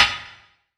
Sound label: snare high; Sonic Foundry (Sony Creative Software); Ugly Remnants VOL-2; Beatstuff